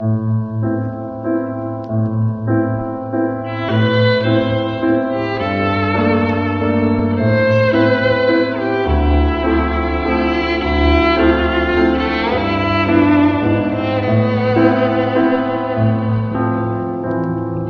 strings, Sad

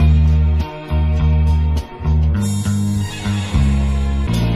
bass, Loops

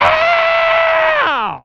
ow, Voice ooh ahh ow ouch

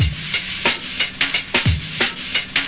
fuzzy ris, Drum loops Boombap

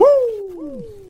"woo"

